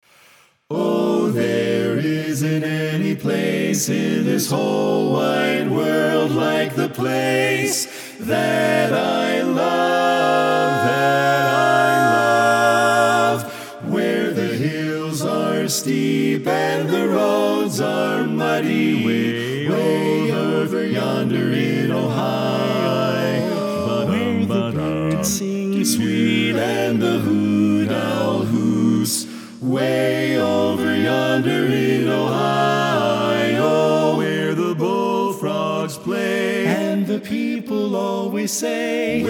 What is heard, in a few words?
Bari Left